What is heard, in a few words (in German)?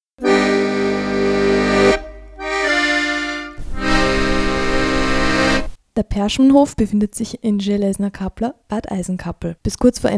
Jingle lang